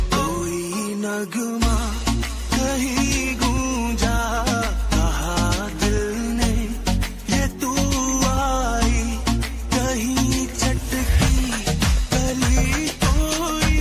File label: song love ringtone, bollywood ringtones